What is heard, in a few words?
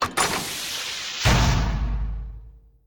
door close